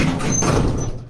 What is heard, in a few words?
elevator doors closing